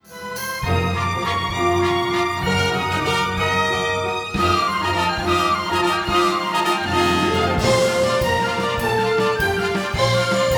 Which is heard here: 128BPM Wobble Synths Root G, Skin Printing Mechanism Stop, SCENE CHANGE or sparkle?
SCENE CHANGE